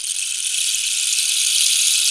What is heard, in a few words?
Rainstik; Mo' Phatt; Digital Sound Factory Mo' Phatt